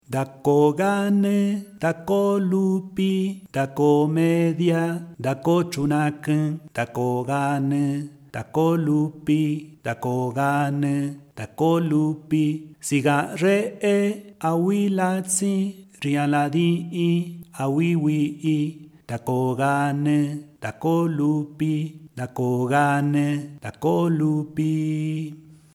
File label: sung